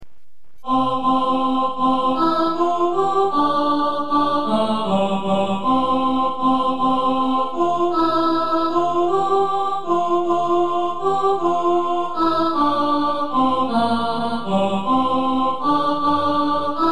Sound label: alto, choir